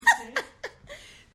Female Laughter; Voice

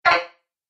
Message Alert, beep

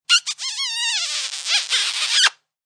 Long Squeal Kiss; Kiss sound; Kissing; Sound Effects:Human

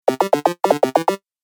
Retro Gaming Sound; Video Game Sound Effects

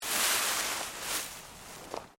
LEAVES MOVE MINOR, Rustling

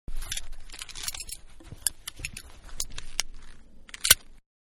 Seatbelt Close, Van-Put On Seat Belt; Cars (Sound Effects:Vehicles); Manhattan Production Music

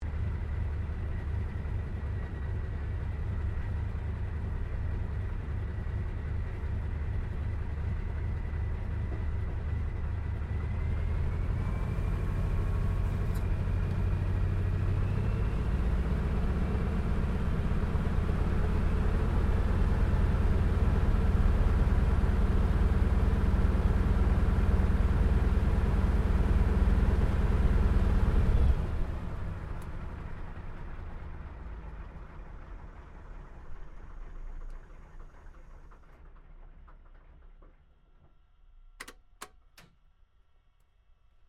AIRCRAFT CA-13 BOOMERANG SHUTDOWN INTERIOR; Airplanes; Vehicles

